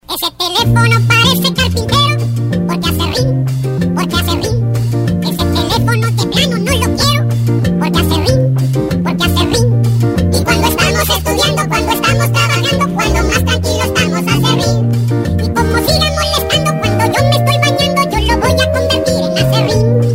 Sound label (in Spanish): telefono carpintero